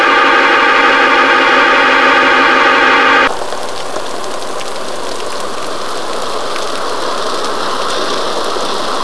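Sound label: melt steel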